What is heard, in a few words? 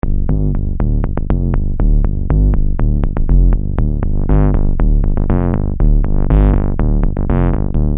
Syn-Bass m G, Techno Synth Loops, 120bpm; Sonic Foundry (Sony Creative Software); Signals I